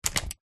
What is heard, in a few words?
household hairdryer fan speed switch no power